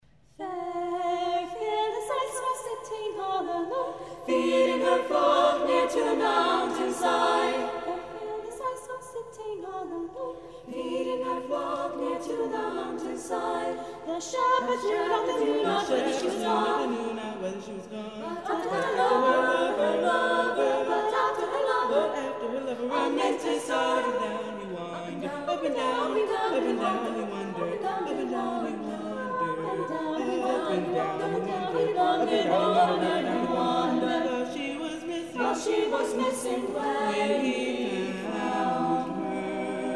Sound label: Madrigal Choir